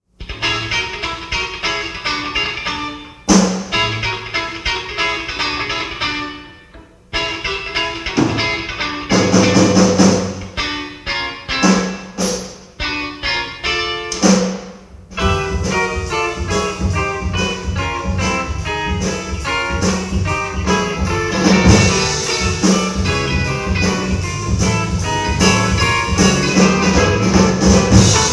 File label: Jazz